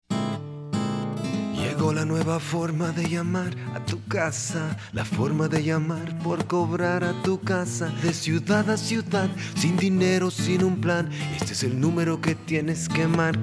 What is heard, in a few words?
Jingle; Sprint